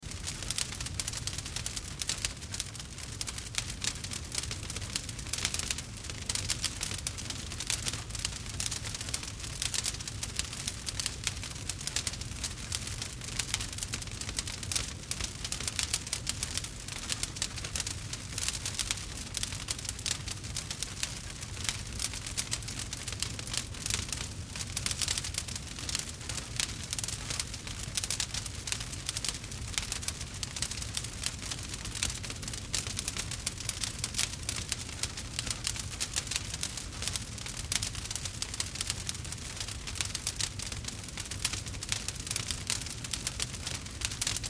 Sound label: Fire Wood Large, Fire Wood Large Crackle Heavy Loop; Flames; Objects; Flame Sound Effects